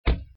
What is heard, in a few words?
door